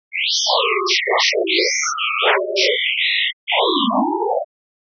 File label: spectrogram; steganografi